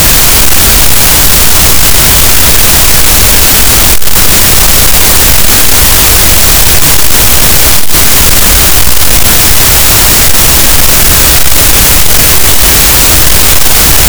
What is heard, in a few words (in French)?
cachalot, gaussian noise; Physeter Macrocephalus